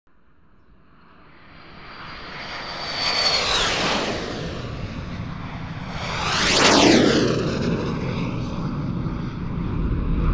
F-16 and Tornado jets; flypast with afterburners (stereo); dbx test